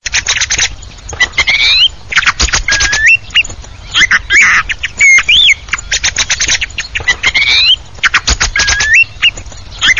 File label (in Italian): TORDO BOTT, birdsound